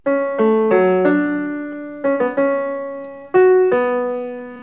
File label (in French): clavier; numérisées sur 16 bits à 44,1 kHz; MODIFICATIONS DE TIMBRE